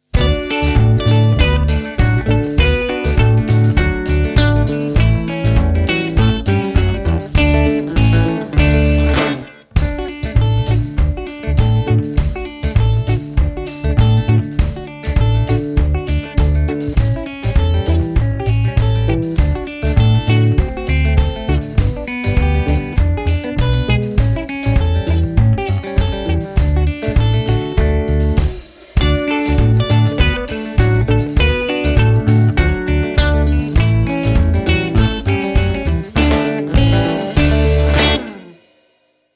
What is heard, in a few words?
head shoulders instrumental